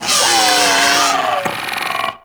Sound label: Arachnoid Wraith Vocal Death; Warframe; Corpus